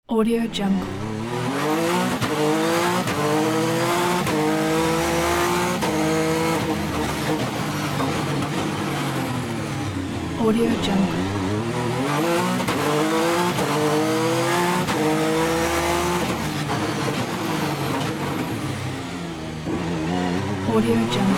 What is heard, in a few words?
interior fast sport car; djsapa